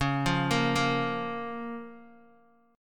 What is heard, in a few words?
Db 6th, Guitar, Open D